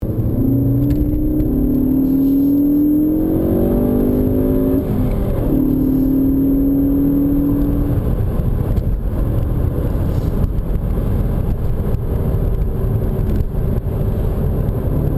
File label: Ford Focus ST Sound Symposer